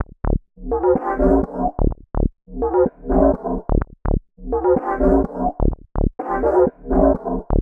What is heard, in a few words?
126 D COMBI GROOVE; Loopmasters; Funky Underground Vol 2; COMBI GROOVES